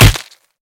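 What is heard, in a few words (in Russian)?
удар приглушенный решительный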